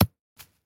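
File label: baseball sounds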